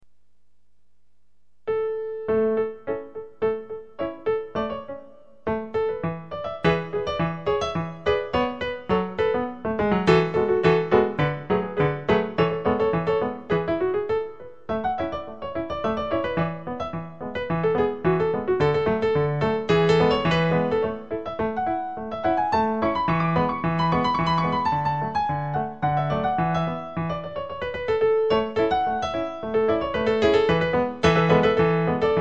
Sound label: piano